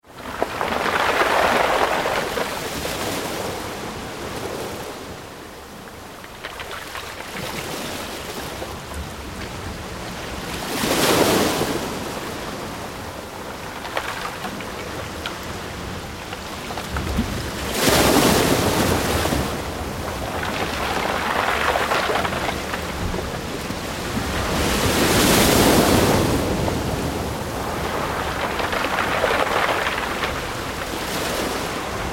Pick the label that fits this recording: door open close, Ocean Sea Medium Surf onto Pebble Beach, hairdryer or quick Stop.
Ocean Sea Medium Surf onto Pebble Beach